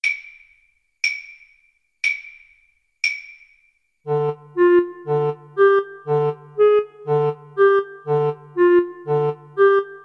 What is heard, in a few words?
Clarinet Duet